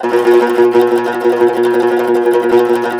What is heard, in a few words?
CUMBUS TR, Cumbus Tremolo